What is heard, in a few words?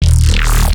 Growl Bass; neuro; BIG5AUDIO